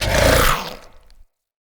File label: Infested Femur Shoulder Fire Stop